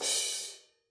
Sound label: Crash Choke, cymbal